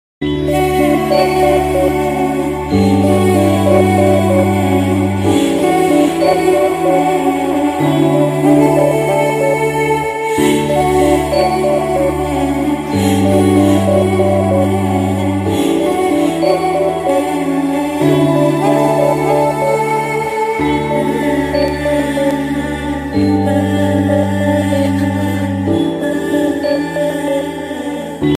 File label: love music bgm ringtone